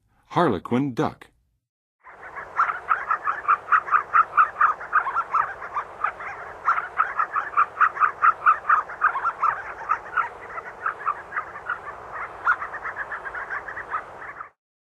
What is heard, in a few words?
Harlequin Duck, Western USA Songbirds; North America